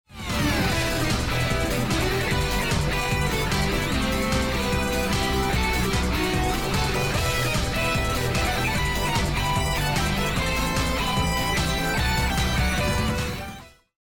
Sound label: choose team; music kits custom